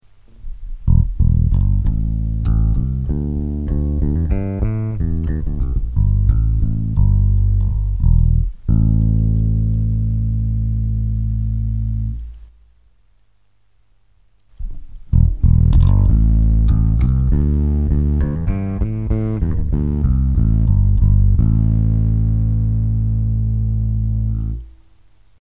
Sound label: chaos, fuzz; ak-workshops